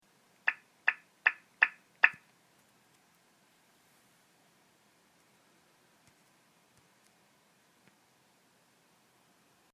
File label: Mobile Volume Sound